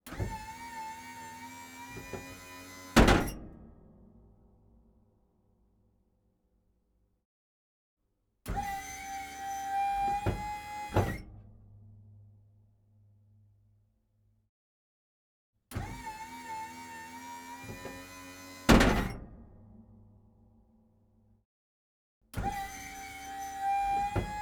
Pvrbbv 551, Combat Hatch Open Shut, Tank Destroyer; ENGINE; VP88